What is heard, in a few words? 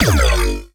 Watery Bass